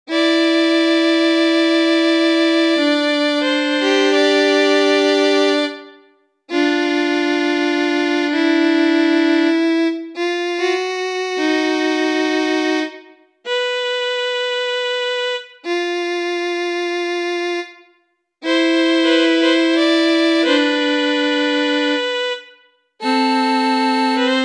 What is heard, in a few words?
concerto